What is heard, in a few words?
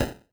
mage hit; Incantation